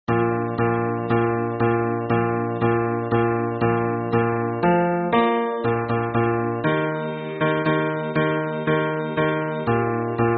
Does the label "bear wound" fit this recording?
No